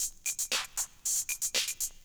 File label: breakin, Drum loops